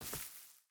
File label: footstep hide grass walk; Rust